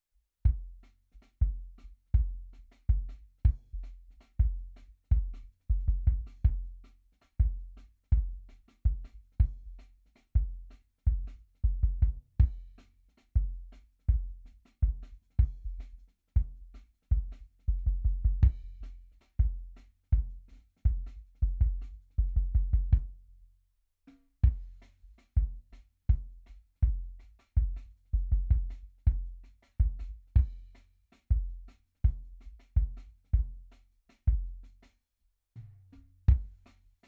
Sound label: KICK SUB, drum n bass; BLIND MAN RELAPSES INTO HARD BEATS TILL HE SEES THE LIGHT AGAIN; RAW MULTITRACKS